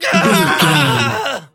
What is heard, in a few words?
voice male death